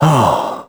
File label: heal; Crypt of the NecroDancer